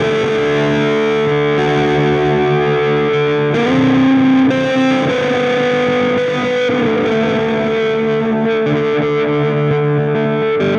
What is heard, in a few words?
Guitar, Indie Rock Anthems; Sonic Foundry (Sony Creative Software); Session 04 - Dubwyzz